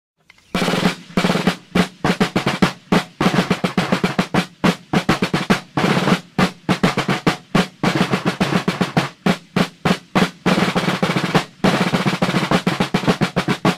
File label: Drum Calls Call To Battle